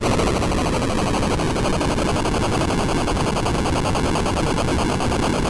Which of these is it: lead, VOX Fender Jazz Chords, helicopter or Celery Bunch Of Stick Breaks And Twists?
helicopter